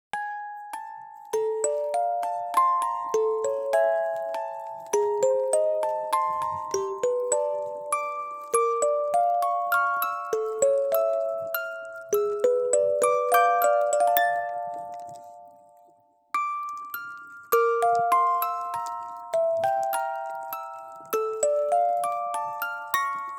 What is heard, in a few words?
Ghost Bell; mahoutsukai no yoru original soundtrack